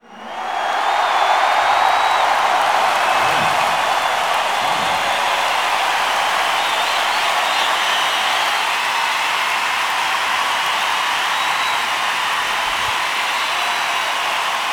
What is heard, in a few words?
Crowd Effects